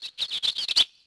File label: shops bird; Tony Hawk's Project 8; XBOX